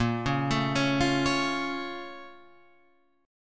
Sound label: Bb Minor Major 7th, Guitar, Open F; BbmM7; arpeggiated; 10,8,9,8,8,8; JGuitar